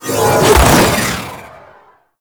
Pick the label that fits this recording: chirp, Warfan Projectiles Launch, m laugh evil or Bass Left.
Warfan Projectiles Launch